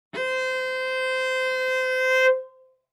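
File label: Cello arco fortissimo sul A C5; MIS Pitches - 2014; Strings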